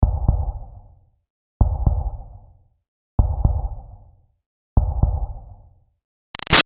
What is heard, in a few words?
Heartbeat Thump; Human; Sound Design; Heartbeats; Big Room Sound